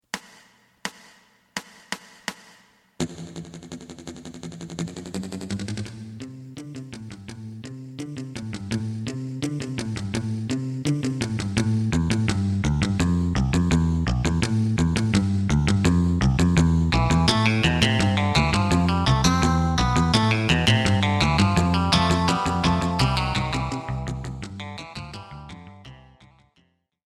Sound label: drum